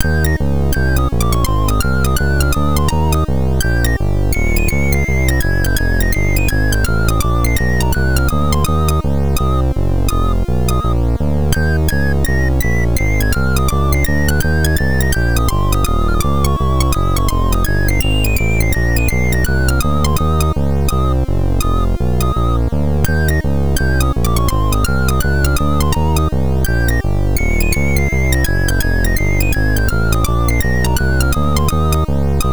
c64 jazz

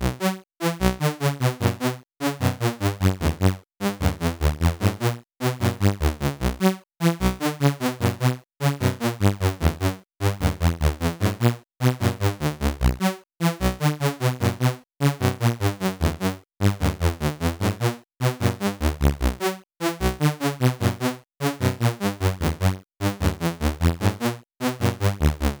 c64 in game; lazy jones

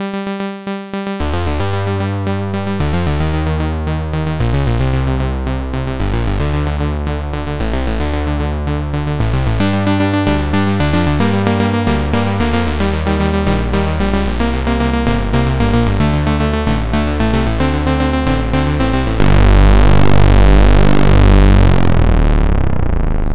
c64 loader; ocean

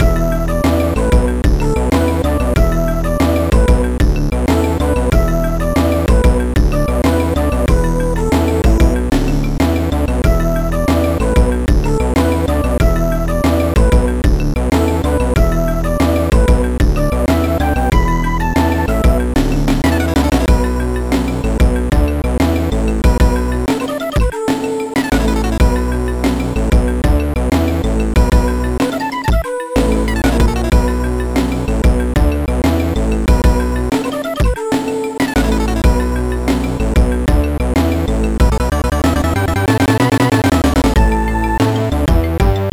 amiga in game; budokan

